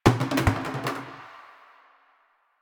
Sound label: Plastic Hit